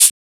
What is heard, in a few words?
Shaker; FLPRO